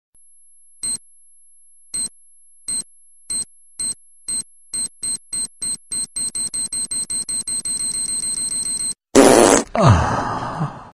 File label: Fart Bomb